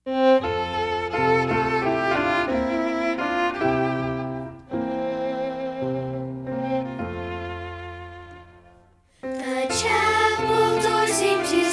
words and music

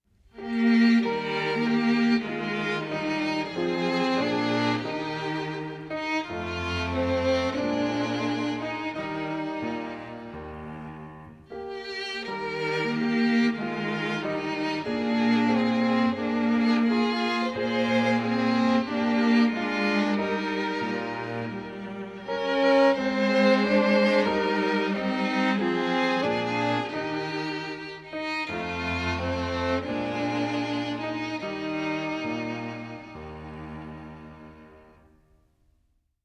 reverence music only

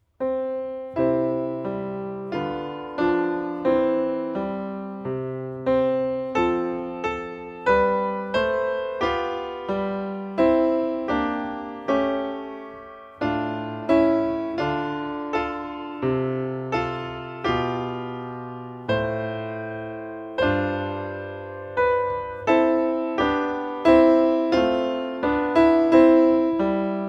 prelude music only; o rest in the lord

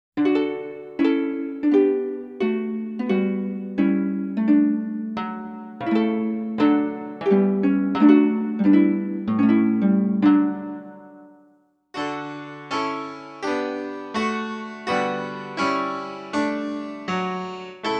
music only; thank thee father

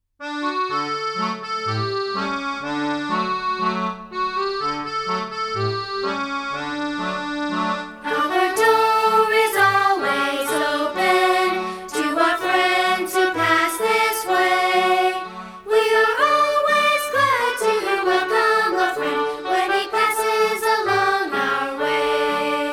words and music

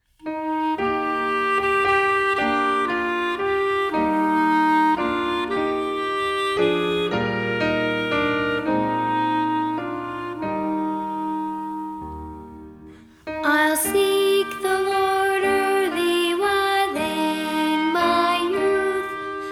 words and music

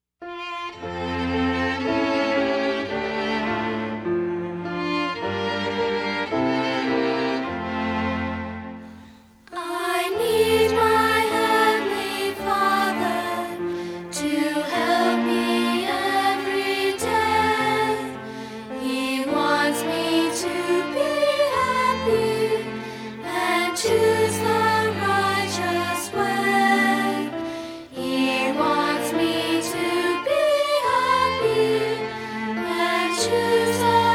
i need my heavenly father words and music

